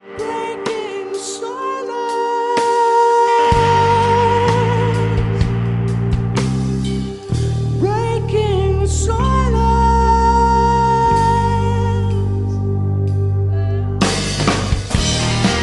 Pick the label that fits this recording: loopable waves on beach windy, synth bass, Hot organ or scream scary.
synth bass